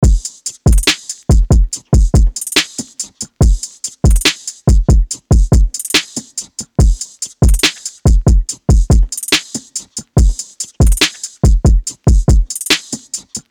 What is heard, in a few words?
142 BPM, Drum Loops; Unison Essential Drum Loops